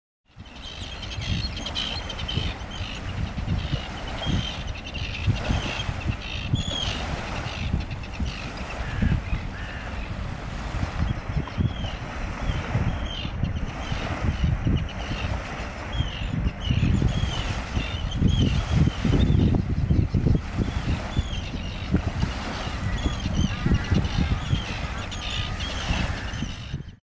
lapintiira; linnut

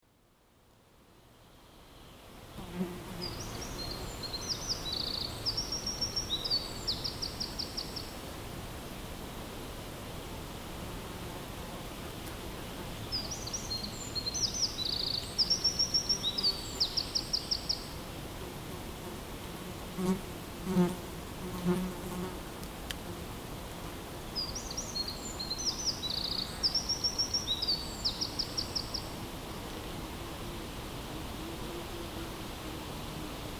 peukaloinen; linnut

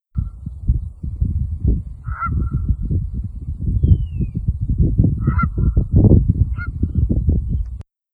kanadanhanhi; linnut

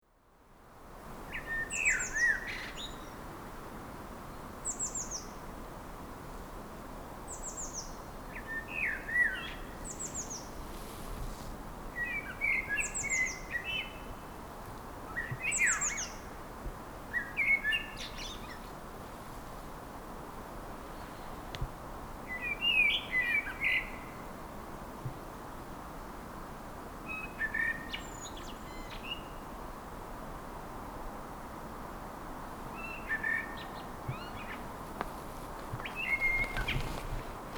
mustarastas; linnut

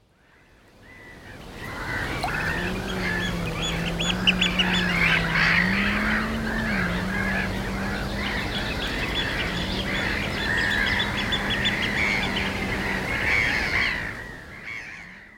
meriharakka; linnut

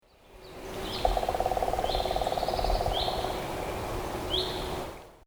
pitka rummutus, linnut

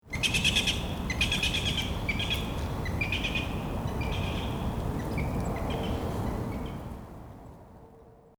harmaapää lento; linnut

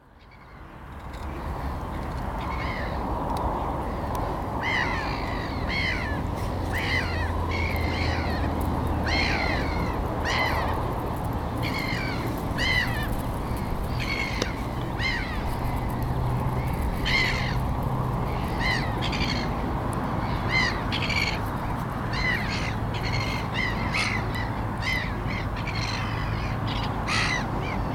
naurulokki; linnut

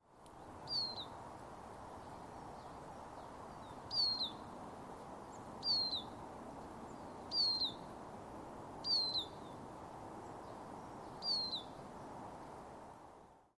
vihervarpunen; linnut

